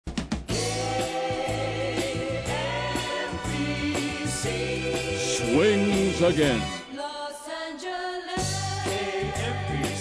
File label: Swings